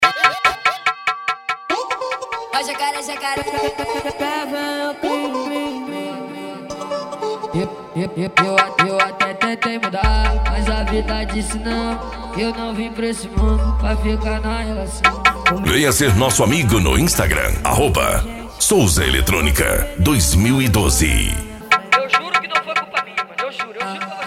edicao automotivo; souza eletronica

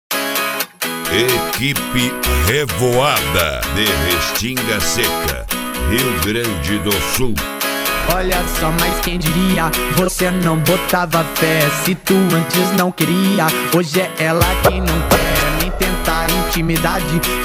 eletrofunk; cd equipe revoada especial fim de ano

